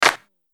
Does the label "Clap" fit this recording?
Yes